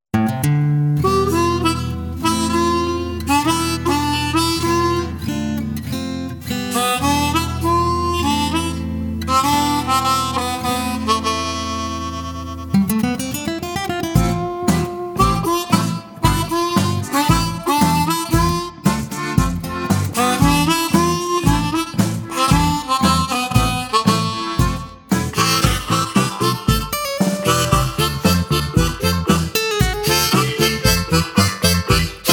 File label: swell tempered tango, bluesharmonica